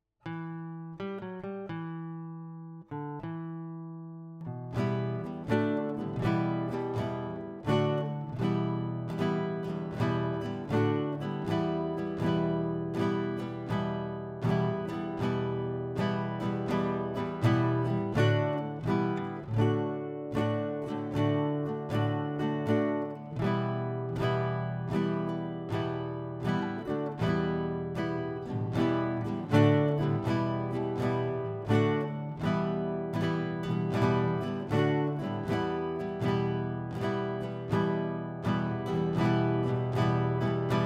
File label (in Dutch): Gitaar